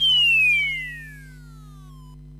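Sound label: Dungeon Pit; Etrian Odyssey III HD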